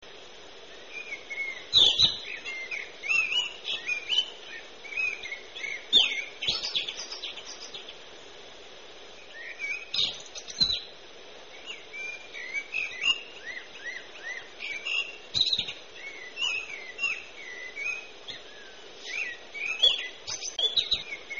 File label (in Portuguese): Melro; Aves de Portugal